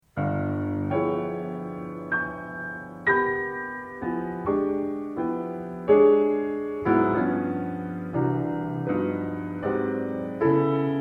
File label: Piano